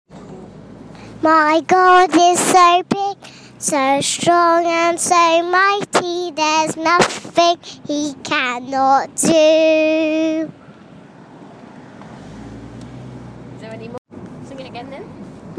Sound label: singing